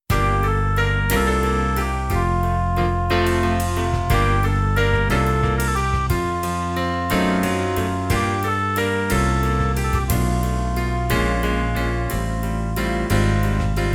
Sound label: Vocal